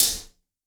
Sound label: ONE SHOTS TRASHER WITH ROOM